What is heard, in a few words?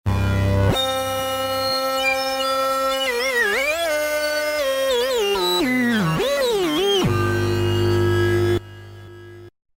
JP8000 Whammy, Roland V-Synth; Factory Patches